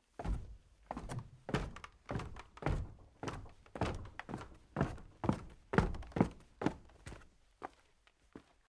Footsteps Walking Down An Old Wooden Staircase In Mill